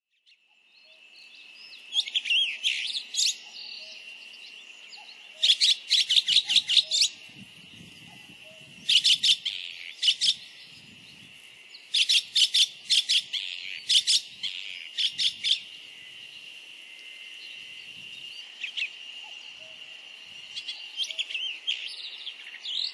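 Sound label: Chestnut-cheeked Starling; koe; nikkou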